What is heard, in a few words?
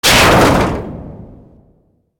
wall cones hit; firefight